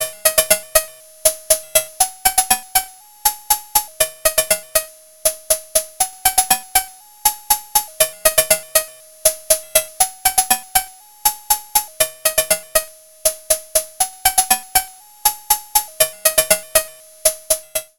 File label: Dreadbox Drips V2